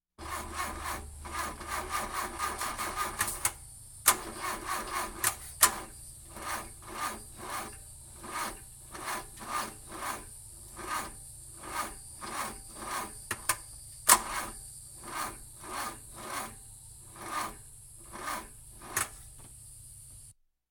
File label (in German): auto mit leerer batterie; Auto-Zweiraeder